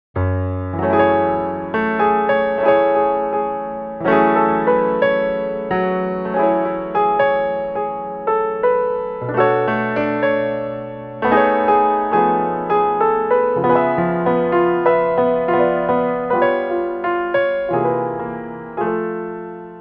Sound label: solo piano